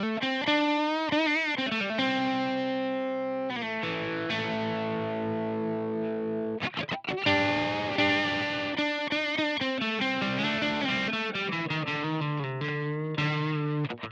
Simplifier DLX Parallel AC Brit MS Brit EL34 Clean Lead